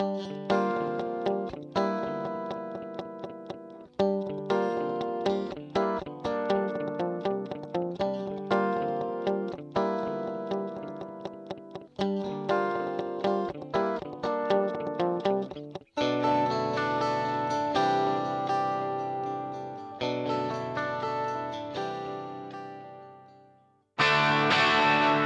Guitar Eventide H8000 Multishift 4 Vintage Digital